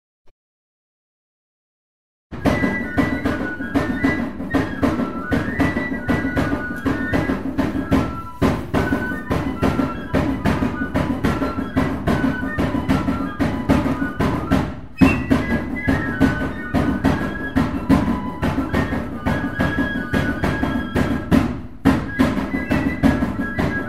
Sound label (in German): Schuetzentanz